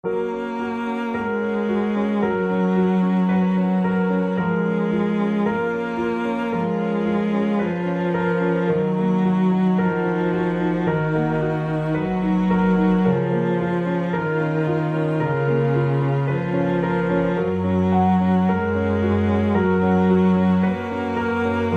Piano and Strings

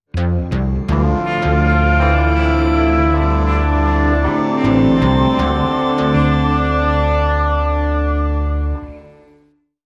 Sting

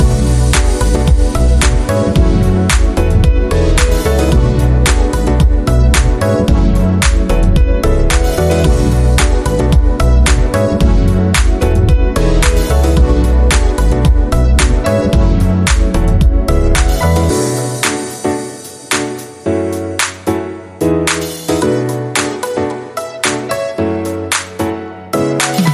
Roller Disco

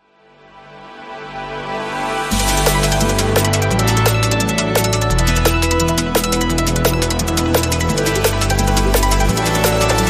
Breakbeat Eclipse

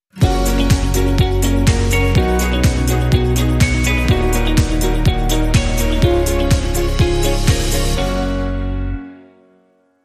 Positive Change Sting

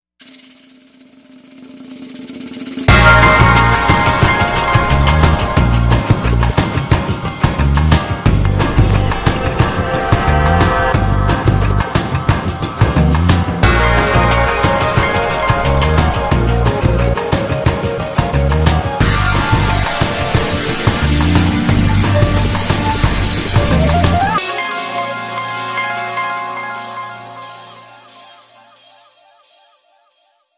Jazzy

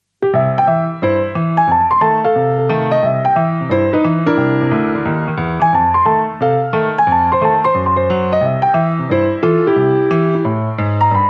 solo ragtime piano

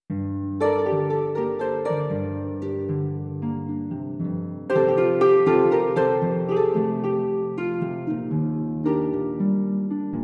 Bavarian Flamenco, Solo Harp

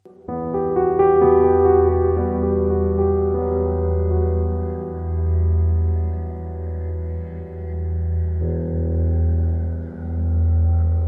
Piano Sting

